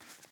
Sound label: reel stopping; arcane